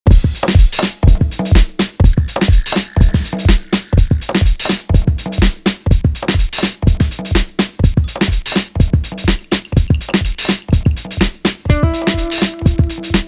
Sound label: guitar break